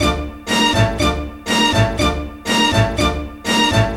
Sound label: Strings